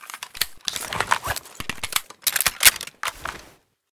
vector reload empty

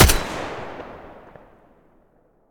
ak12 muzzle mono, weapons; STALKER; IWP

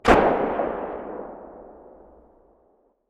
muzzle mono far, weapons

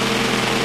small fan, ambient indoors; STALKER; IWP

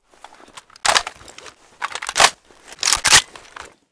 aug reload empty; STALKER; IWP

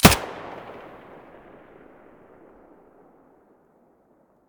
mp5 muzzle stereo, weapons

